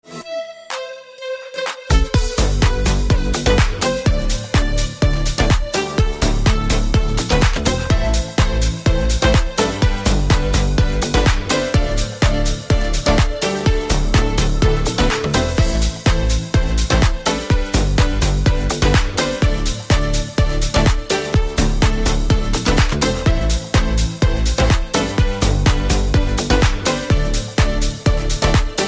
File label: Instrumental Ringtone